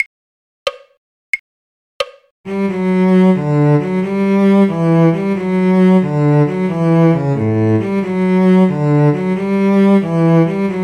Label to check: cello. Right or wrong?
Right